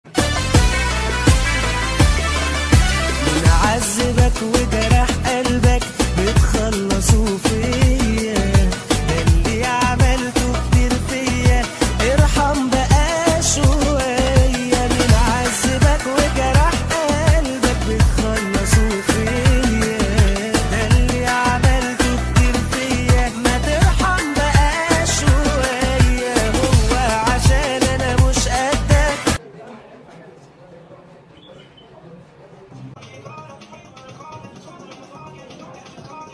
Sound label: Arabic Song Ringtone